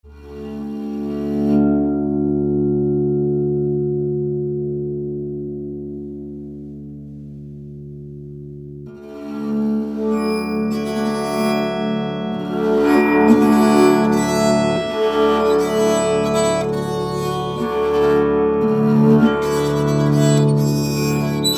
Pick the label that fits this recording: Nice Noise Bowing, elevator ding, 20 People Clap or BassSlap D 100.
Nice Noise Bowing